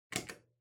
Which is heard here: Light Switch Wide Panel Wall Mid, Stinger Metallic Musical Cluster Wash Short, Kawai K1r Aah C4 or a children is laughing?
Light Switch Wide Panel Wall Mid